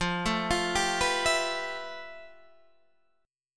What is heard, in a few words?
F Major Sharp 11th Guitar Half Step Down